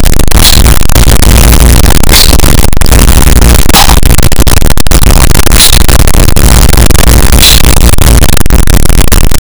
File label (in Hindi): bahut halki tin tin ki aa, toys